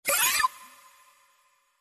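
negative robot alert notification synth electronic cartoon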